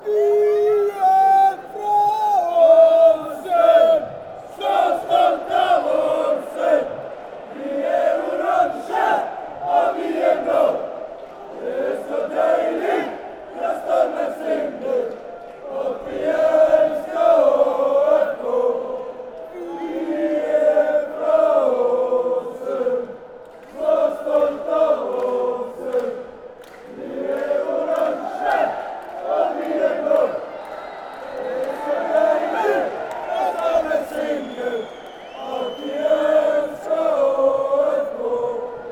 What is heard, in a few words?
chant; aalesunds